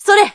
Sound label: jump; sakuya